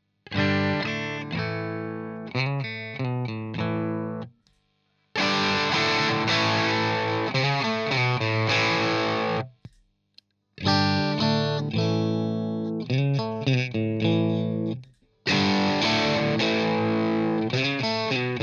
Screamer CH1 negativ 12 Tone 12 Gain all positions Chords; JHS